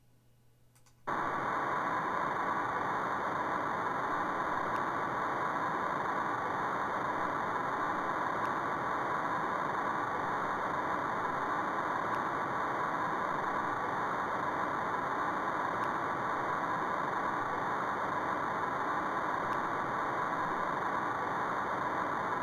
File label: stochastic amplification